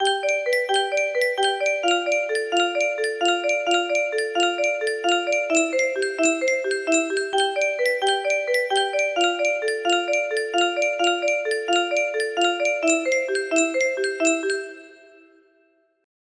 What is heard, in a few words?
music box